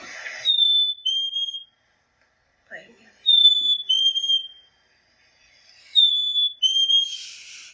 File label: Black capped Chickadee